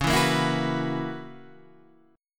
B Major 7th Suspended 2nd Suspended 4th Db, chord shape